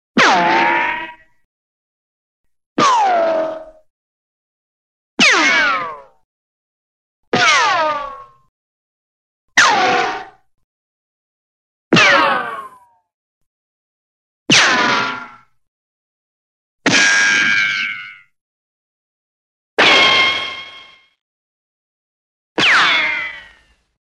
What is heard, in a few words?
bing